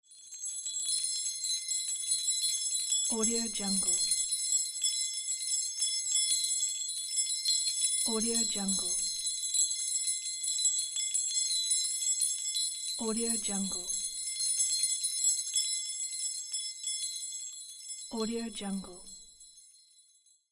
christmas bells; n-audio

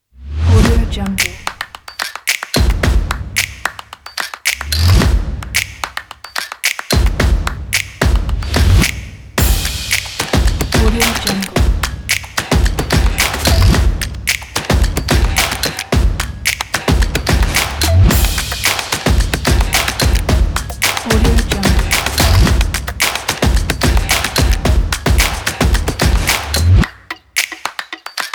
drums promo

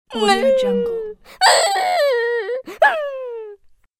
cartoon lost crying voice

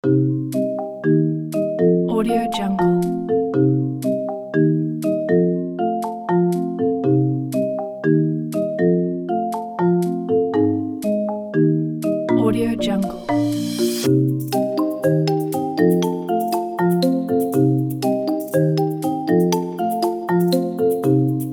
mysterious mallets

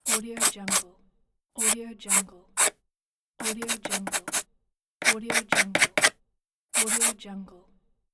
pencil drawing line

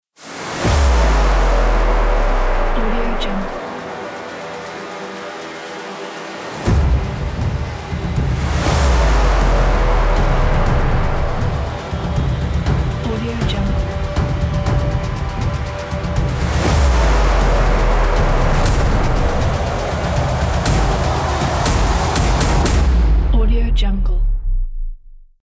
epic game opener ident

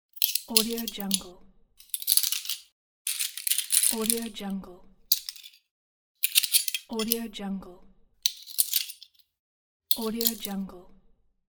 silverware

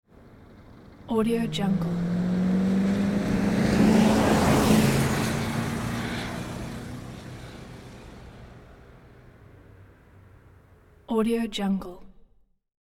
1970s pannel van drives fast; hollywood edge

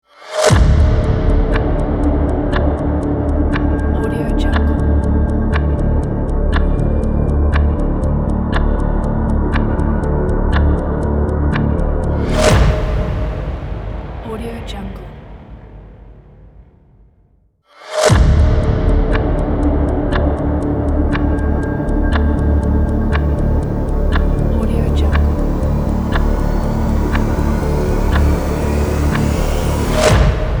countdown trailer shorts; epix inc

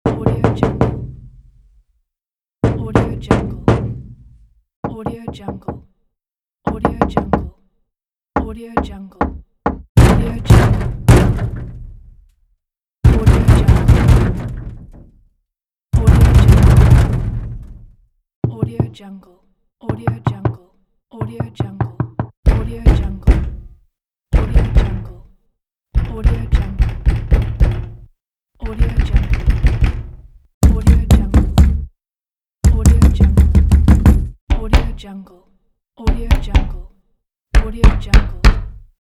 knocking pack

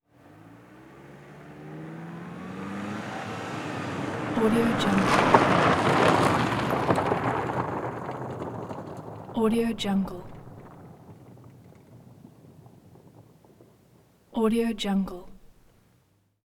car chase skid and peel accent

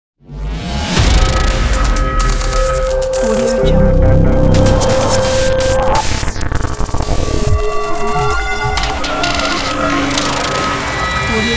hitech glitchy futuristic logo

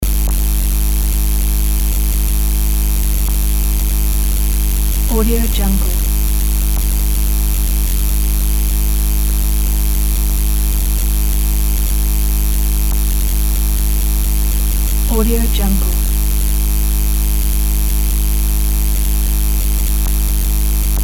electrical interference; mountainaudio

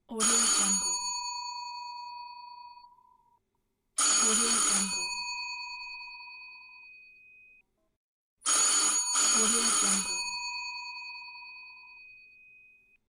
doorbell ringing

